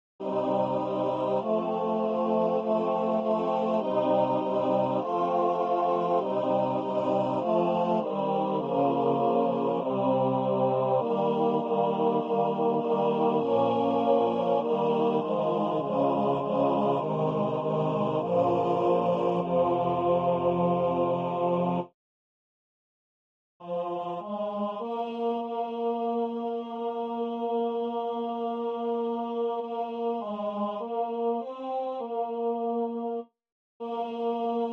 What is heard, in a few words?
coro; sala ensayo